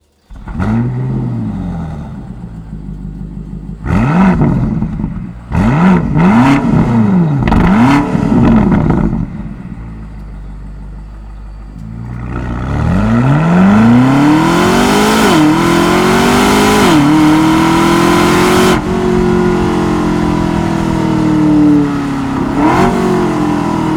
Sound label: Evolution Line BMW XM; g09